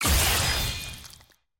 skill hit; evelynn; skill 2

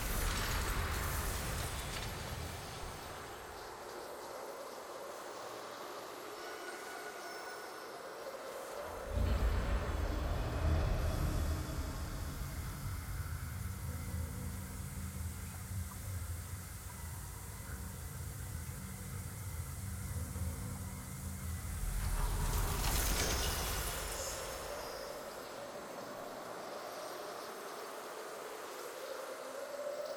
amb preseason

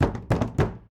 action knock door

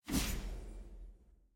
transition toast; tft sf2023

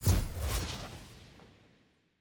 member banner intro; parties

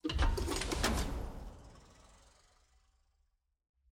sfx ui collection record open click; caitlyn

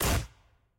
skill hit; sett

